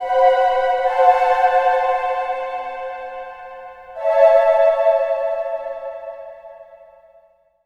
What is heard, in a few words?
Orchestralic, Pads; Sonic Foundry (Sony Creative Software); Modular Electronica